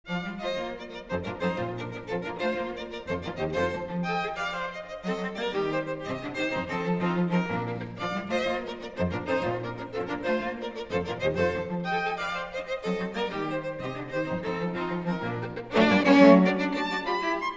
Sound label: String Quartet in B flat; Beethoven